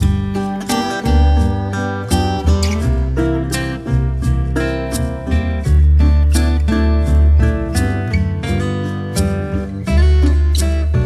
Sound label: Yazoo River Basin Reso Blues w Dobro 85bpm